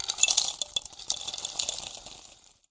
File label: Coffee Beans; UNUSUAL KITS and FX; ModeAudio